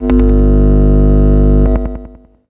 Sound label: Perc Guitar FX; pitchglitch